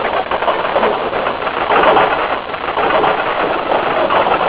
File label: wagon